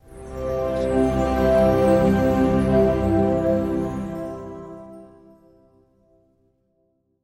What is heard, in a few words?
Inspiring Ident; SoundsCrate